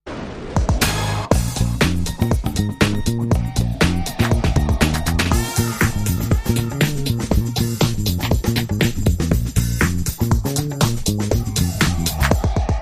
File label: Step To It With Lead Guitar